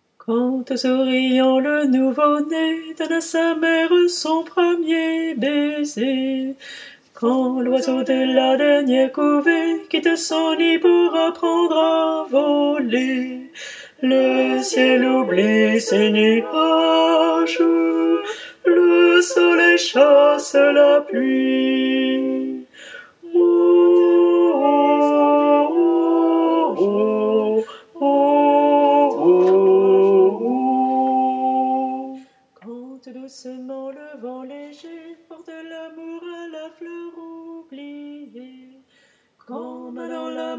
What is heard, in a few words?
basses; Le chant des saisons